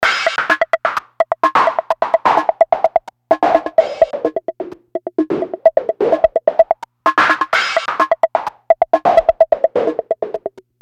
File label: Slow Rock Wha, 128